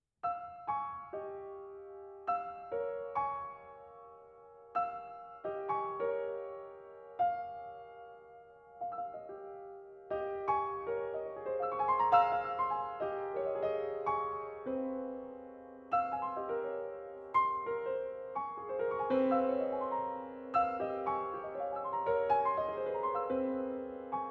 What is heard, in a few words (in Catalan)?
Campanes; solo